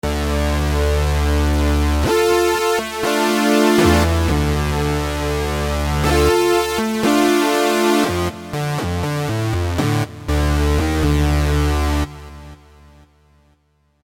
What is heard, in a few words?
Attack Synth Prophet-5